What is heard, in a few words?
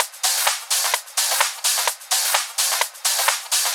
House Loop, 128 BPM; Vengeance Samples